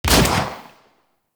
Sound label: Fire Shot; Warframe; Lato Vandal Redux